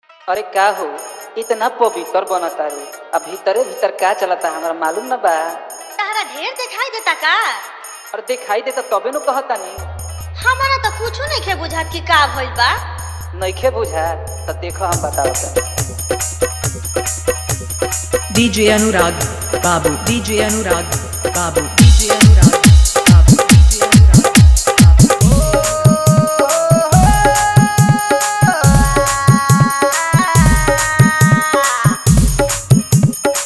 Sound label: Hard Dhollki Bass Remix